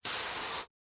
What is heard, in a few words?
vehicles car windshield spray water jet from hose short ext